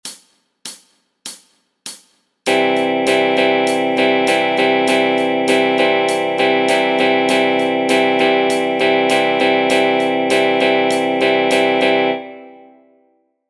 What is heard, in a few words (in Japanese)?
ドラム と ストローク パターン